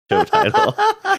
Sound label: cute laugh